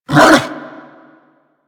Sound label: Bark, Kubrow; Warframe; Tame